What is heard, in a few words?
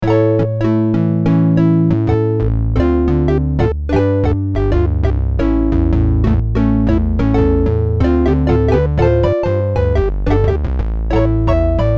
Analog Woody, Prophet '08